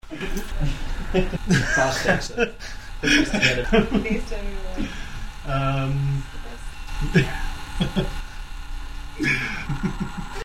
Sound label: laughs